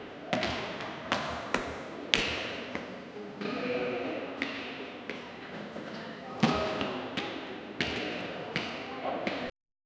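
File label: indoor gym ambience movement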